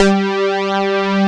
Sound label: JUNO POLY G3; E-MU Formula 4000 Series Vol. 3; Analog Odyssey